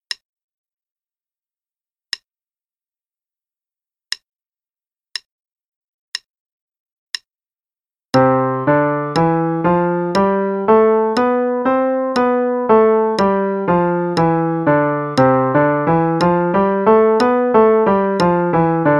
Play along C Major Scale (one octave as 8ths, triplets, 16ths, qn=60); Piano